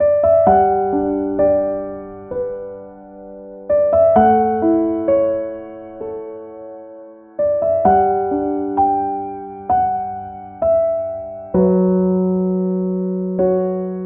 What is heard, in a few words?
no strings; By Burak Sound Bank; dramatic